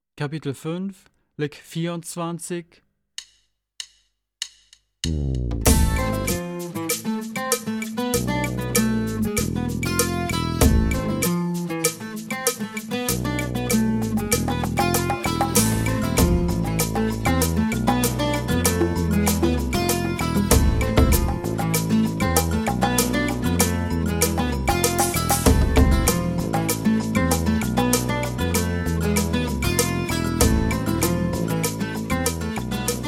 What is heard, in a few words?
Latin Style Guitar